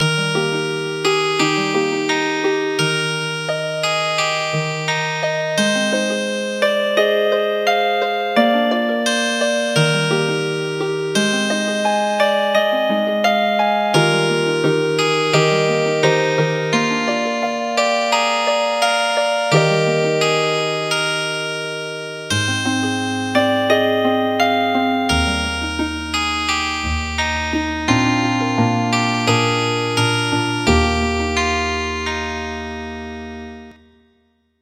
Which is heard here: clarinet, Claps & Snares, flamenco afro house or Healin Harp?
Healin Harp